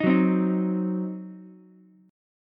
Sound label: C Diminished C, Ukulele; strummed; JGuitar